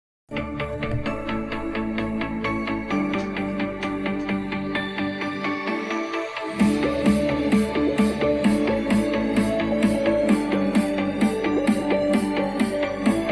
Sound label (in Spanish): Logitech z333 muestra audio; review